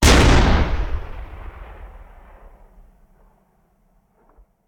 Cannon Tank; Sound Ideas; Guns

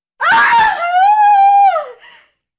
Scream Woman; horror sounds; Hollywood Edge

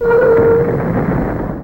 Door Underwater Open; Doors

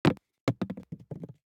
DICE on Glass, Throw and Roll, Foam, Two Dice; Samples; ArticulatedSounds; DICE Sfx Party (Extended)

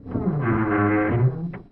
Creak Large Metal Ship; SFX Kit

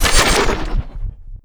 Gun Weapon Pickup; SFX Kit; Pickups

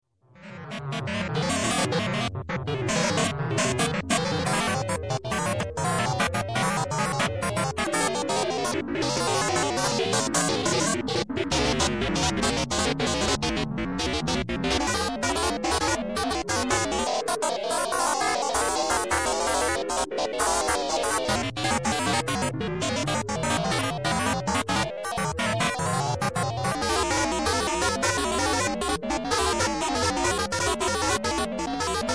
Space Mystery Tune, Electronic, Music; Sound Ideas 2000 Series

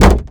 hit human hood ricochet; Blind Drive

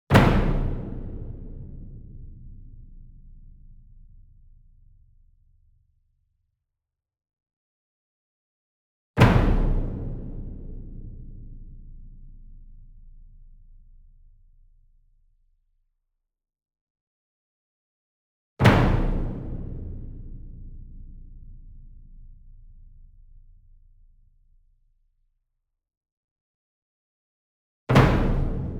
SINGLE DRUM Verdi 66 Inch Flam Hard Beater Medium; boom library; Cinematic Strikes Construction Kit

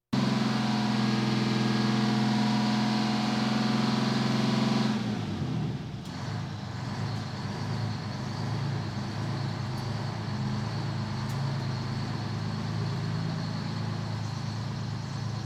Police, Fire engines

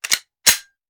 Hand Gun Foley; Sound Ideas; Guns

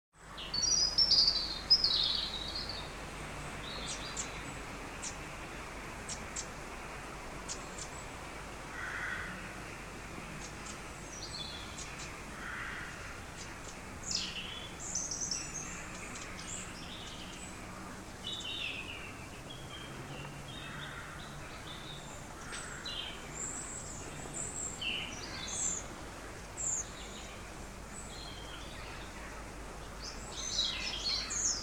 Birds Early Evening Birds; Blind Drive

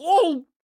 police hit bad short; donnie; Blind Drive

